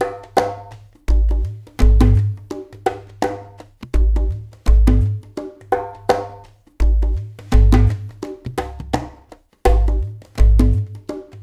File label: PERC MX, SW.CYMBAL; Spectrasonics; Liquid Grooves 1 & 2